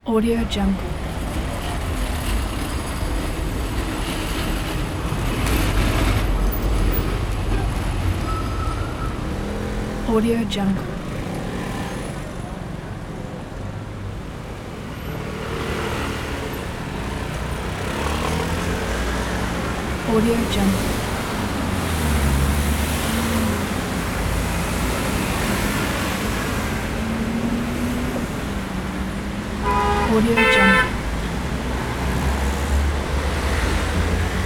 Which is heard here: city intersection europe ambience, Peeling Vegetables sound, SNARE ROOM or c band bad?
city intersection europe ambience